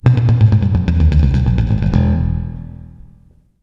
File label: Bass, 130 BPM; Sampleheads; Ultra Freakin' Bass